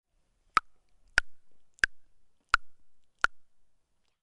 schnalzen

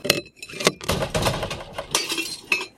Glascontainer

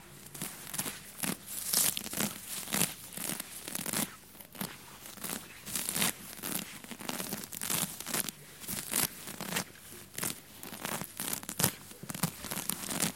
gras ausreissen

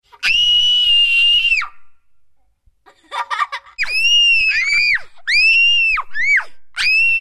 spitze kinderschreie

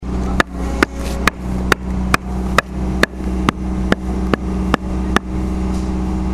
steine klopfen

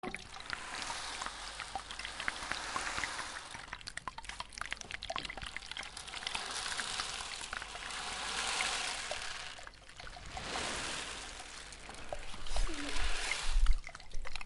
regen wasserablauf

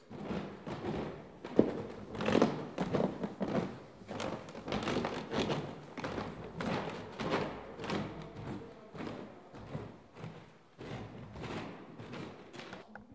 Knarzende Treppe

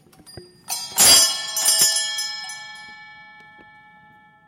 beginn gottesdienst

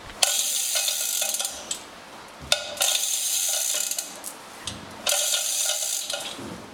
flaschenzug

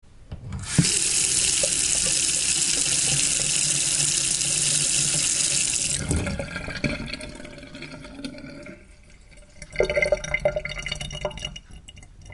wasserhahn

